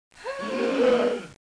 gasp; Professor Multimedia

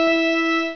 ORGAN; SimEarth Demo; Artificial Life

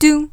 UOW, Vocal Samples

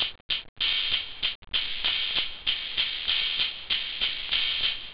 low, techno; OctaMED SoundStudio V1

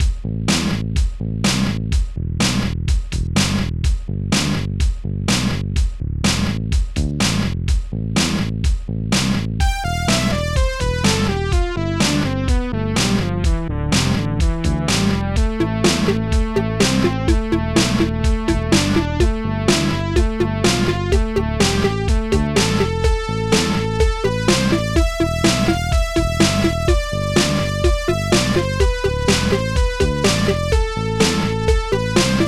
beat

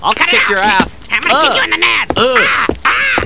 PAIN

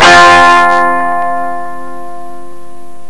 Power Chord

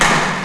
handball; Web Clip Empire 150,000

